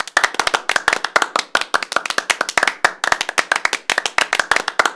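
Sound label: HAND CLAP; AKAI S-Series CD-ROM Sound Library VOL-6; SMLL CHEER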